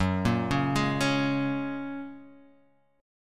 F sharp Major Add 9th, Guitar Half Step Down

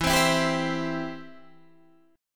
E Minor Sharp 5th E

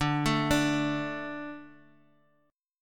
D 5th D, down, Guitar, Half Step Down

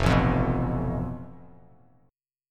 F Major Flat 5th, Bass Standard; FMb5 strummed; JGuitar

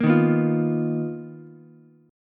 B Major Sharp 11th, Ukulele, C6; BM#11; strummed; JGuitar

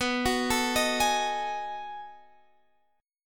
B 13th B, chord

